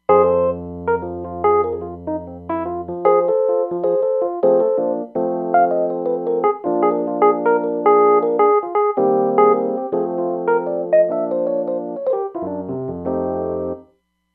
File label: Dry Suitcase, Roland Fantom XR